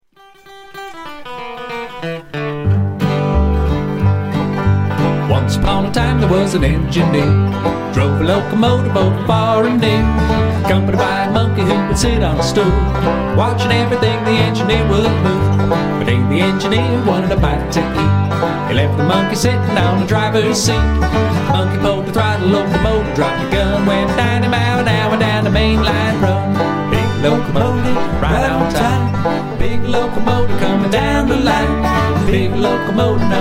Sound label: Bluegrass